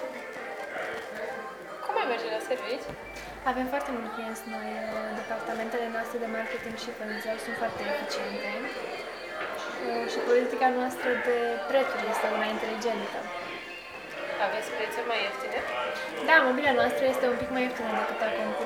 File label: audio sample, background noise